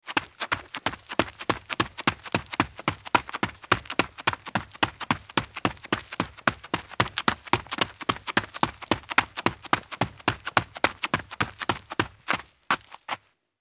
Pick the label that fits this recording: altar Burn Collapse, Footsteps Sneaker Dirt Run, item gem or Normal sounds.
Footsteps Sneaker Dirt Run